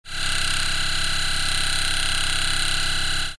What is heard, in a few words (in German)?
Bahn 16Hz Oberwell